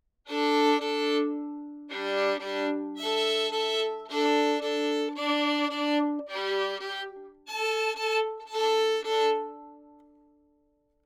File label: Violin Db Stops 24cm from F hole; MXL990